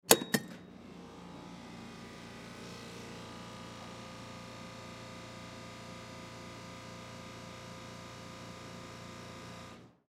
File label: Wheelchair Door, Wheelchair Door Open Push Button; Hospital; Emergency